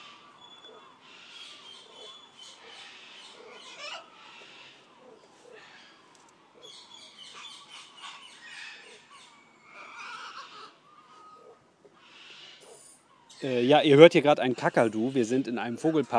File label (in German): plausch mit einem kakadu